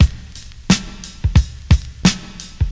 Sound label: 88 bpm drum beat c sharp key, kick tuned to the C# note